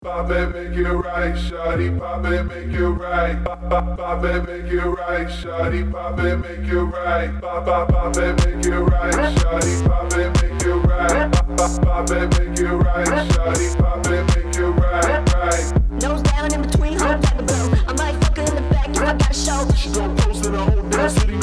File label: vocal pack